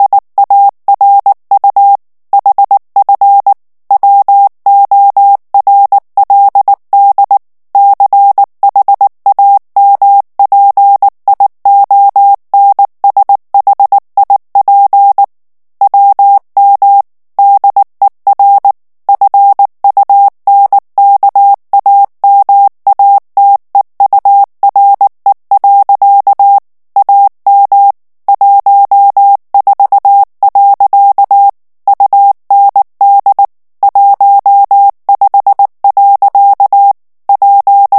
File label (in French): ALLEMAND texte clair vitesse 18 mots minute, morse